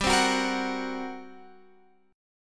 G Minor Major 7th Double Flat 5th G, Guitar Open F; strummed; GmM7bb5